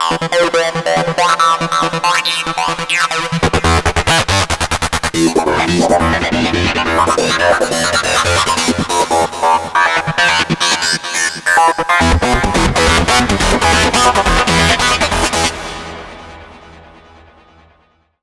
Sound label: SHIMMER ACID